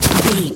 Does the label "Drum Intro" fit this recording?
No